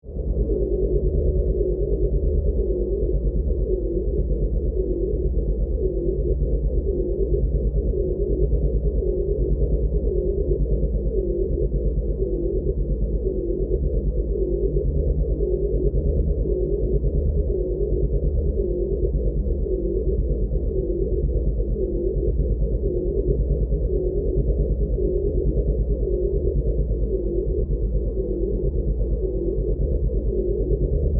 Drone Alien Power, Source, Scifi; Science, Fiction

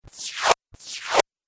Droid Suction Cups, robot

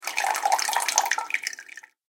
Water Fast Dripping; Water Sound Effect; Splish, Splash, Splosh!

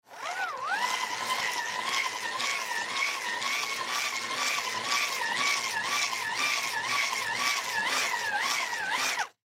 Flashlight Manual Hand Crank Turn Multiple Fast; Foley; Blastwave

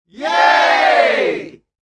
Crowd Yay, Small party crowd or studio audience; saying "Yay!"; Lynne Music

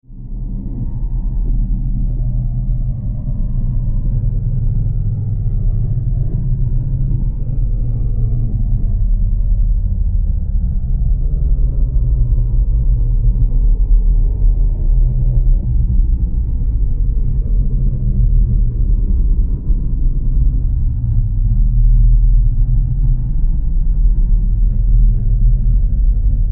Drone Dark Low Flange Swell; Sound Design; Blastwave

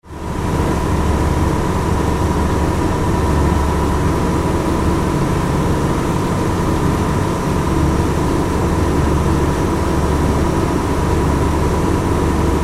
Ship Large Car Ferry Engine Room; Tom Hutchings

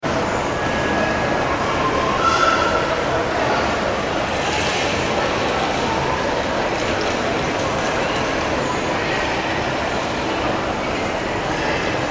ambience shopping mall internal busy; Shopping Mall Busy Interior Ambience; Stores and Malls; Backgrounds; McKinney Sound

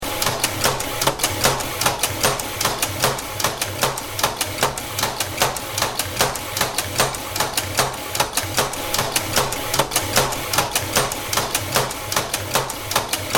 Slicing Machine, Automated slicing machine loop; Machinery; Machines

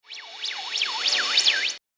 Scouting For Robots, robot sound effect; Science fiction sound effect

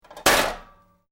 Metal Debris Impact Short Medium; Small metal junk crash. Tools, pots & pans or metal parts small impact with rat; Debris Sound Effects

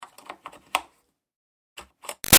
1-4 Inch Plug In-Out Guitar Amp; Guitar Amplifier; Switches; Objects; Big Room Sound

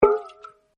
Waterdrop; Water Sounds; Sound Effects:Nature

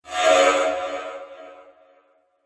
Laser Static Flyby; Laser Sound

